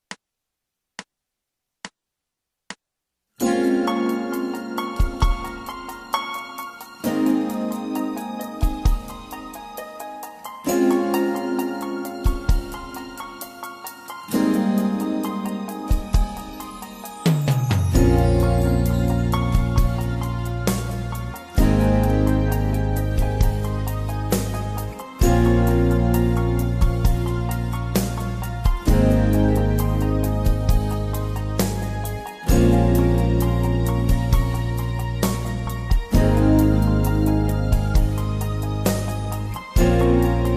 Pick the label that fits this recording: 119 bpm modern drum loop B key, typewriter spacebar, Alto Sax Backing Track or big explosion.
Alto Sax Backing Track